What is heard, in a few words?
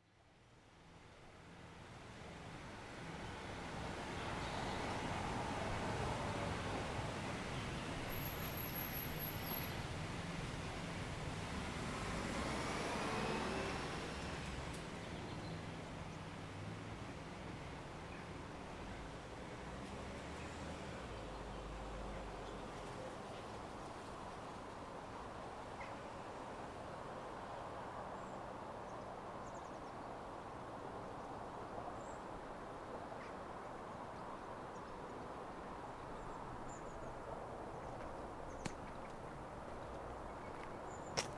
Ouse Walk Soundscape